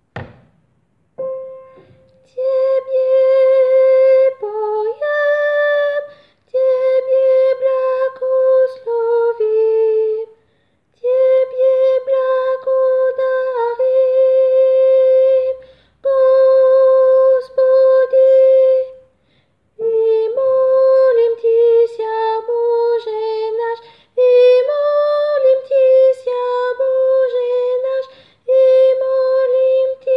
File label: soprano; Tebe